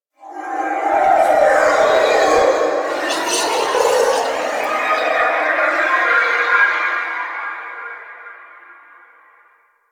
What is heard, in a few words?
Tower Whisper; Warframe; Quests; TheNewWar; Ambience; Cetus; Unum Tower